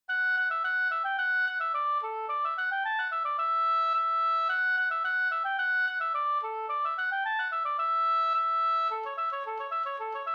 brannvinspolska; Rundar; A Jeppson

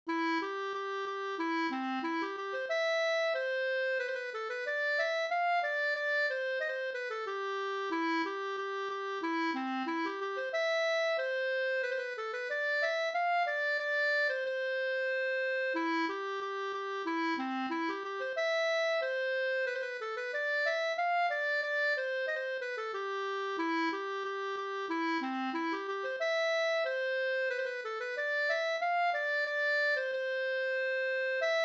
polska Fardpolska

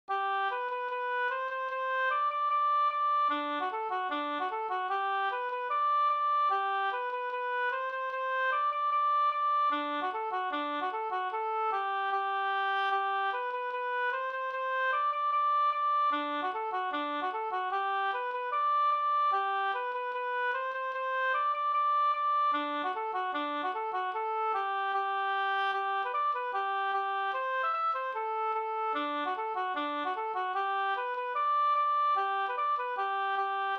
schottis Gdur

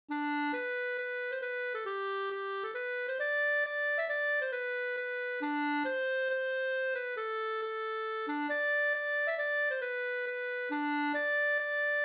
hambopolska

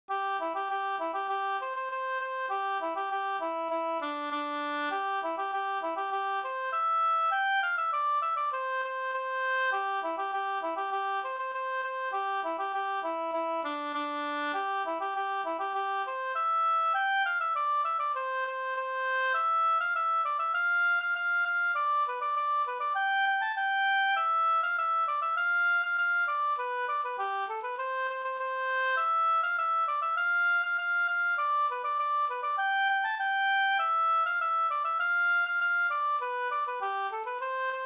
engelska; e Per Eriksson e Carl Erik Hartman

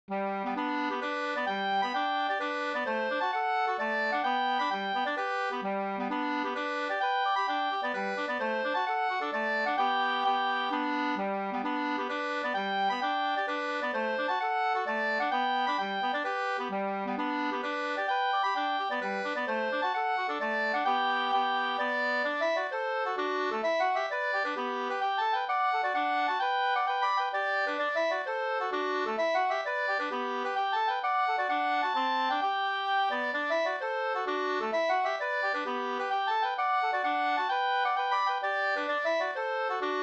mazurka, 2st; Svalans middagssovel SvL Nk221

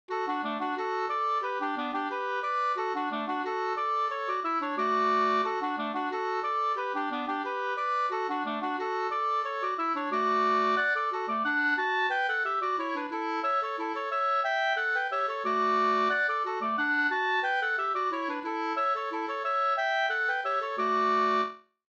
engelska; fr Nattraby

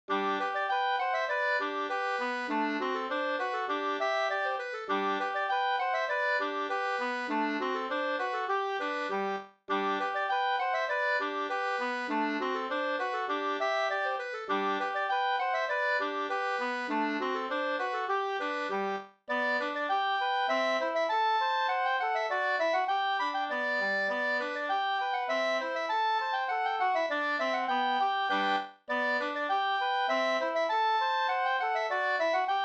reinlender

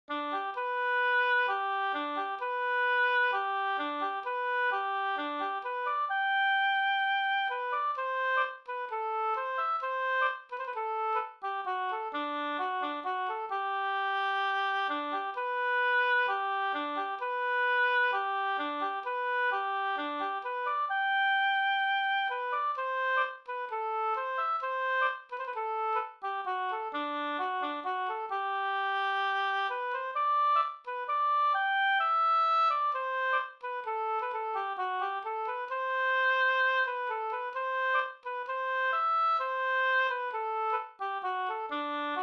skanklat

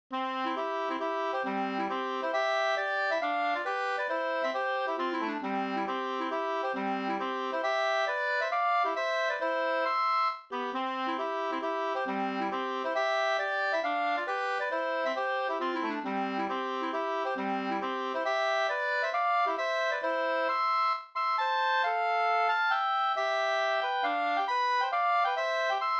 hambopolska, 2st; Hambo fran Kryssbo

